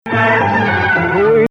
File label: Nawa Athar Gameel Rast